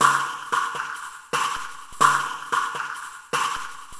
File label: Electronica Drumloop